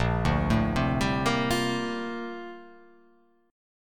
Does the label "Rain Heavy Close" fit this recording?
No